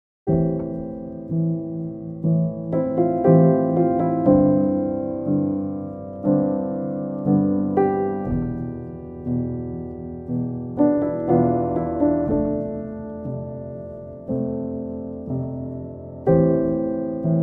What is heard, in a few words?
Solo Piano